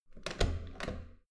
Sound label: metal door heavy handle use